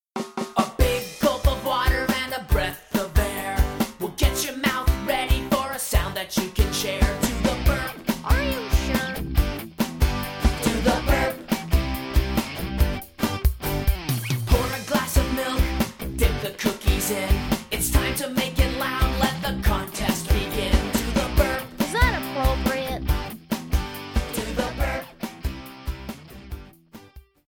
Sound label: Burp; RDR